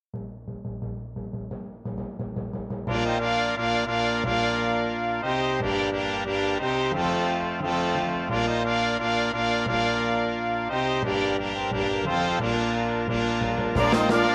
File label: La Mourisque - Synth; T. Susato